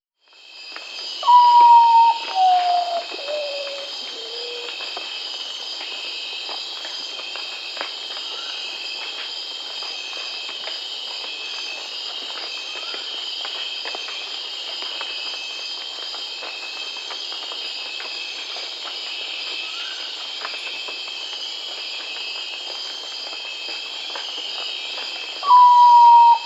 Common Potoo, Nyctibius griseus, Nature's Songs, S. America